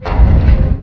Collision

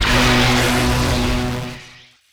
Laser Fire

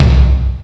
Collision

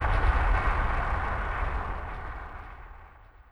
Death Int

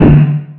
Collision

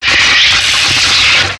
Laser A Release

